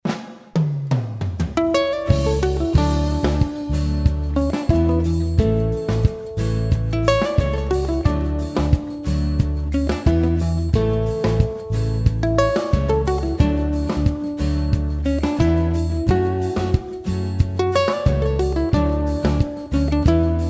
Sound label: acoustic dreamer